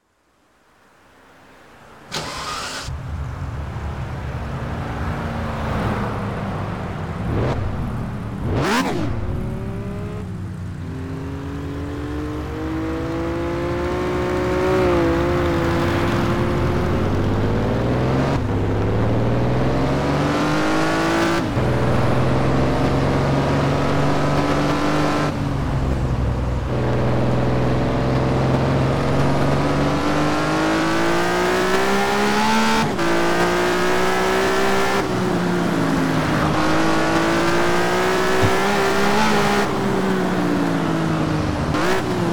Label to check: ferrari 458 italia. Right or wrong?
Right